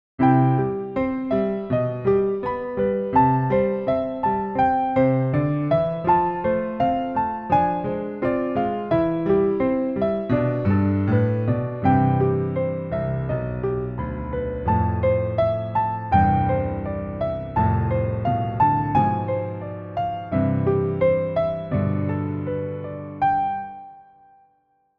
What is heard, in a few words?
Piano Practice; the legend of zelda majoras mask reborn 2023